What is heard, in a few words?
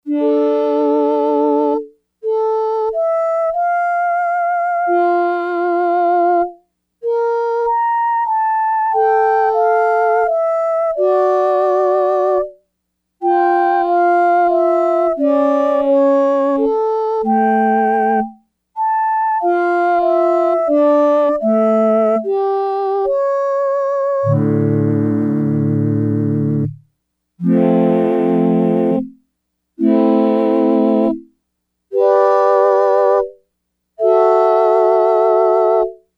Yamaha DX100, Voices; Factory Patches